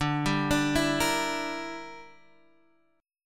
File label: E Minor 7th Flat 5th D